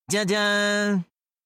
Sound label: tah daaaaah